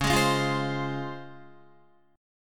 C sharp Major 7th, Guitar; C#M7 strummed; JGuitar

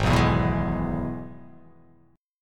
G sharp Minor Sharp 5th; 4,2,2,4,4,3,x,x

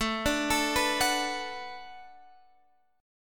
B Minor 7th A, chord

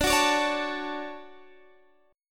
D Major 7th, D, down, Guitar DADGAD

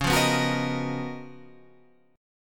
Db 13th Db; strummed; JGuitar

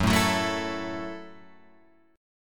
F sharp Minor 7th F sharp, Guitar Open F; strummed; JGuitar

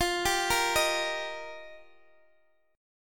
F 7th Suspended 2nd Suspended 4th, Guitar DADGAD; F7sus2sus4; arpeggiated; x,x,15,12,13,13

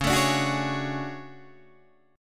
D Major Sharp 11th C sharp, chord

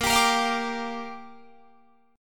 A sharp 6th, Guitar Drop D

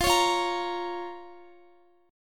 F Suspended 4th Sharp 5th F, Guitar; Fsus4#5; strummed

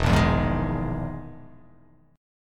E Major 7th Flat 5th down

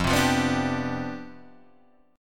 C Major 7th Suspended 2nd Suspended 4th F, chord

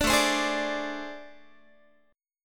A Minor Major 7th Double Flat 5th A, Guitar; AmM7bb5; strummed; 17,17,15,18,18,x

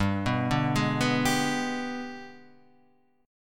G sharp Minor Major 7th Flat 5th

